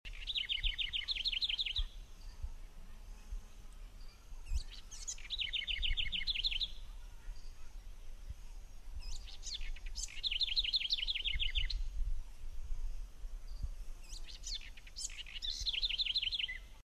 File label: Troglodytes aedon song